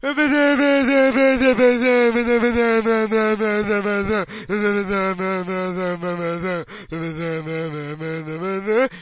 VOCAL OR VOXY LOOPS; MELODIC LOOPS

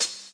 classic hip hop H; STYLE KITS (Hip Hop, Etc); Classic Hip Hop Mega Kit

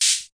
T6 Hats; DRUM MACHINES

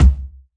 kick

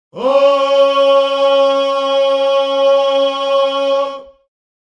low voices male, Male Oh

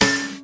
zithr hit; World Music

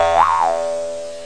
jawharp; World Music

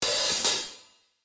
Super Hat, DRUMS, REAL LIVE KITS; Osama Qureshi DrumKit

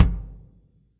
real kick; A Real Freakin Drum Kit

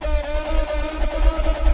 138 subtle distorto vox rhythm; VOCAL OR VOXY LOOPS

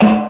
crunch sd, snare; single hits

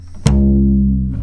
Upright Bass Notes

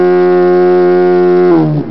lofi klaxon gliss down, electronic hits

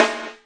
hard rock snare; single hits

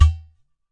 udu HIT, Udu Clay Hand Drum

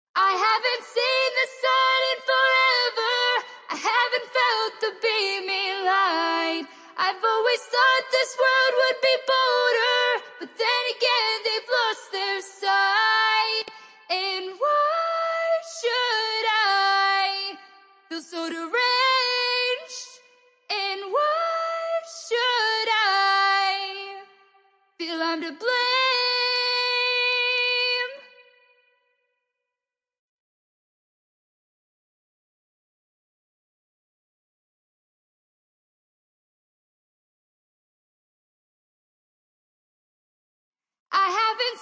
Lost Their Sight 140bpm G major, VOCAL ACAPELLAS

